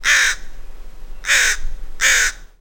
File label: crow; WAR